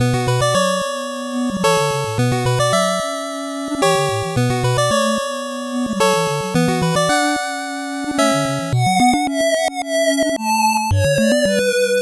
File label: 8bit bgm fast